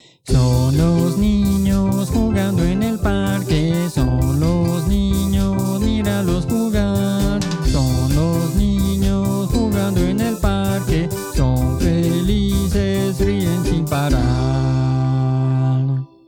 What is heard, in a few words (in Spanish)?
Ninos